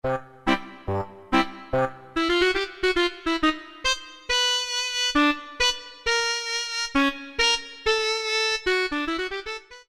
Yamaha TG33; BR-Moot

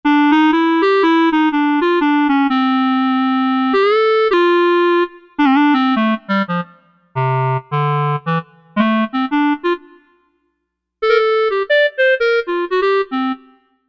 Clarinet, synth

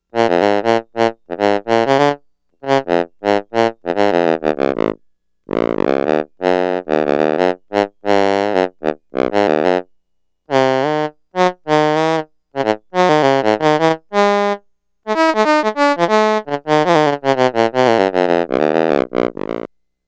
Saxophone, Roland SH-2000

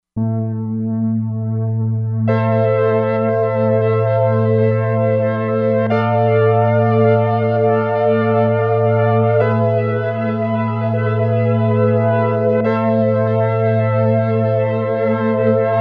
Pad Luna Pad

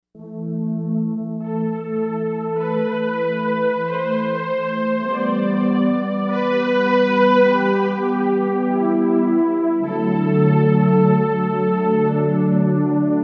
Soft string, Korg M1Rex; Factory Patches

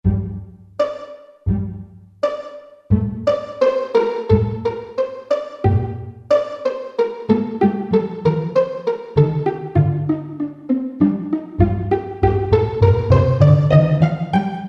Pizzicato, Roland SR-JV80-02 Orchestral

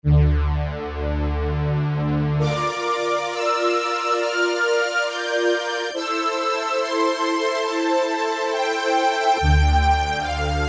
HIGH STRINGS, Casio VZ-10M; VZ ROM Card

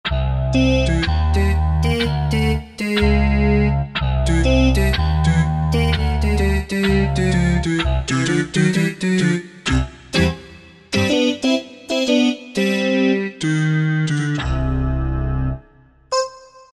Voice Wave, Korg M1; Factory preset examples

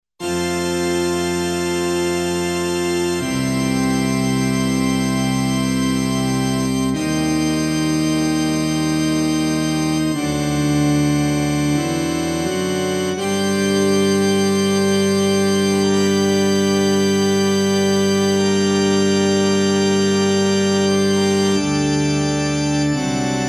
Church Organ

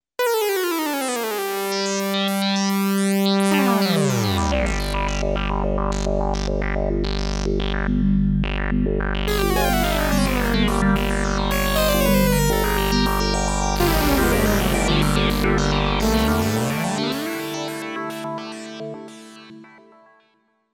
Fat S&H, Oberheim OB-8

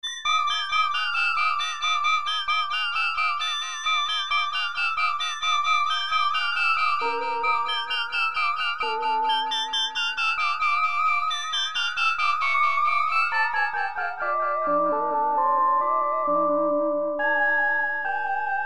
Circus

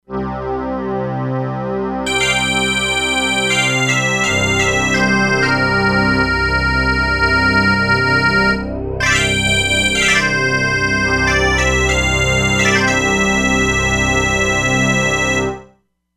Bagpipe, E-mu Proteus-3; Factory patches

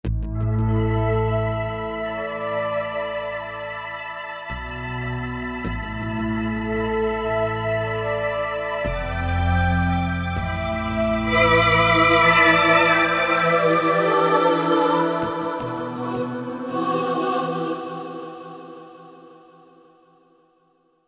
Bass & Quiet

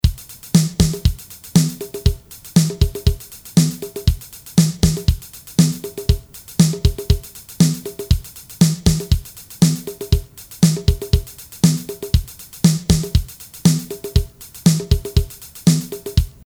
A cute sequence; Akai MPC1000; Flash memory demos

